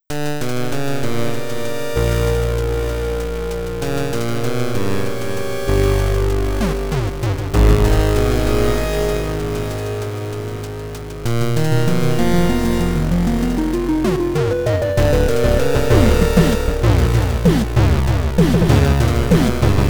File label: Chiptune; Ghost Town Too